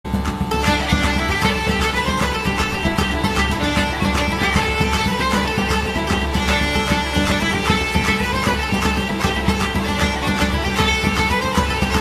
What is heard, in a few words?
irish Traditional Ringtone